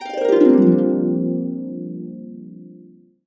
Bb, Harps; USB Soundscan vol.62; Complete Harps; AKAI

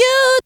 VOX; Zero G; Man Machine

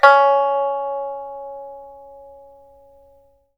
PIPA C#5; Spectrasonics; Heart of Asia

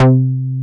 A-ARP, AN BASS; AKAI S-Series CD-ROM Sound Library VOL-7

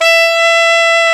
SAX A. MF E, Alto Short; Roland LCDP07 Super Sax

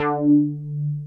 JX BEEF D4, BEEFY BS; Drums In Your FACE!

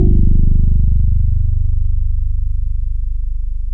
TX 802

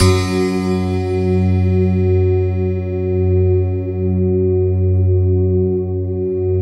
SYN PIANO, Roland JD 800; Sound & Vision - Gigapack I CD 2; JD 800/990

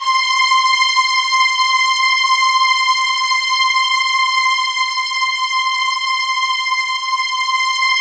C5, Roland JV 2080 TECHNO PAD

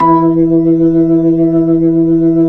B-3, Jazz

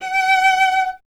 STR VC F#0, Cello; Miroslav Vitous; Solo Instruments VOL-1

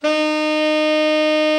TENOR, TENOR SAX; Best Service; Brass Super Section

